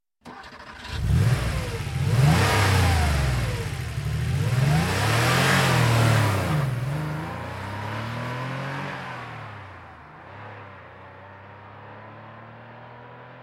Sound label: porsche start